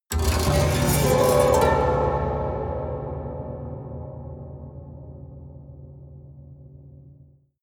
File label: haunted strings up stroke fast hard